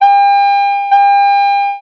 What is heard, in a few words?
red alert; Radars